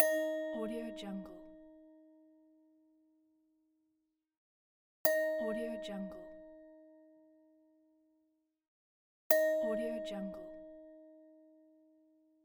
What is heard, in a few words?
metal vase hits